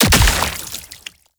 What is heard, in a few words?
Dismember Gore Impact; Warframe; Weapons; Bullet Impacts